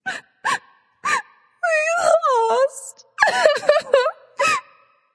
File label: boohoo; smite; aphrodite